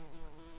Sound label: bumblebees, Lucorum queens